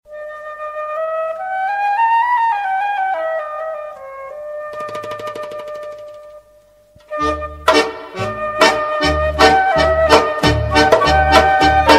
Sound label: music idol carry distant lp 141bpm; Hebrew urn pack